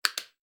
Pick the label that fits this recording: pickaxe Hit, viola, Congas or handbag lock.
handbag lock